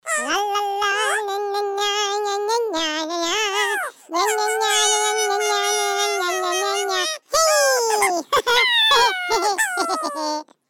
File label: Jingle Bells, ringtones; Maus au Chocolat; Phantasialand; Kerstmis; muizen uit Maus au Chocolat bekende kerstliedjes zingen; muizen uit de taartenfabriek